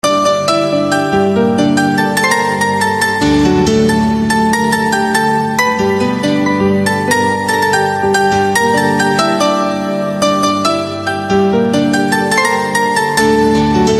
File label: Piano Instrumental Ringtone